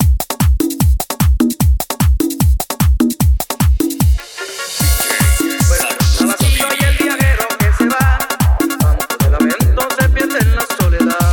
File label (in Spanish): Solo limpio